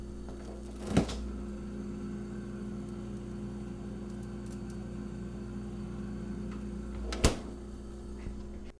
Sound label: Refregerater Door Oepining And Closing